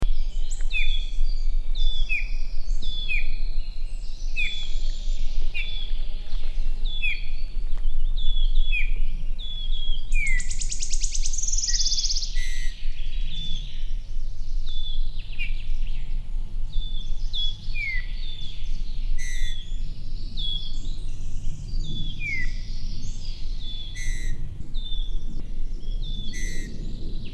Pyrrhula pyrrhula; SETE

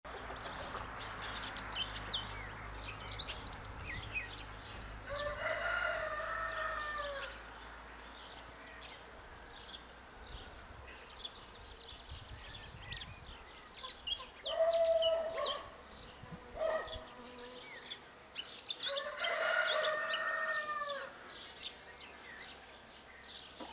Sylvia atricapilla; SETE

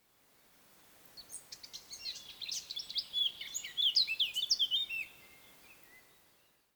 Sylvia atricapilla; SETE

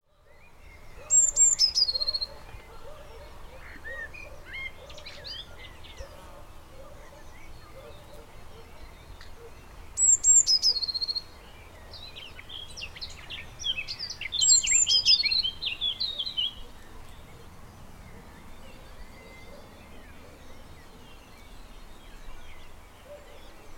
Cyanistes caeruleus; SETE

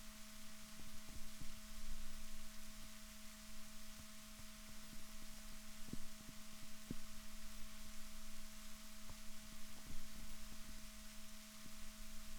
rorquals negatif, Balaenoptera Physalus Chile

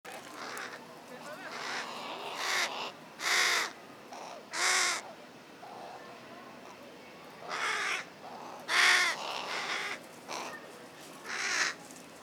Corvus corone; SETE

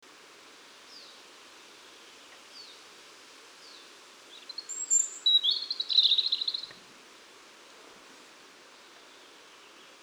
Erithacus rubecula; SETE

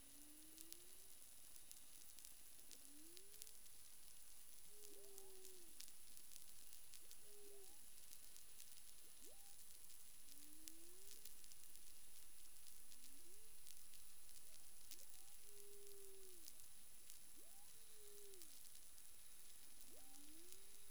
humpback whale; CARIMAM

